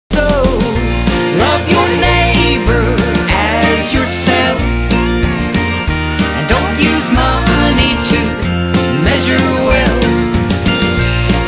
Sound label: Slow Dance